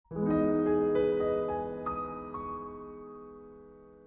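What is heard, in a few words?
Glassy sky notification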